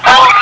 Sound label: Duck flock sound short